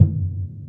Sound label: Piano Sound Board; Proteus 2000; Multisamples; Digital Sound Factory Proteus 2000